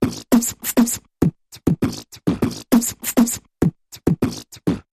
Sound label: Big Mouth Percussion Variation